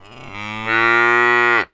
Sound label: holstein cow mooing